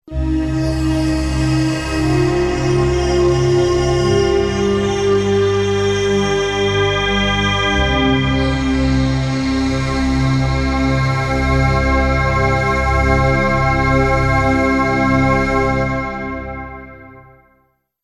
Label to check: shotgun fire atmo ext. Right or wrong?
Wrong